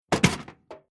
Tool Box Placed On Ground Two Impacts Metal Rattling; Tom Hutchings